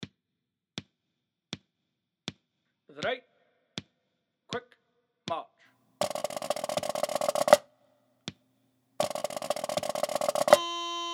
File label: 4/4 March; Army Manual